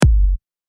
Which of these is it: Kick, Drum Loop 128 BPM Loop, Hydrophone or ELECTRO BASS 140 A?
Kick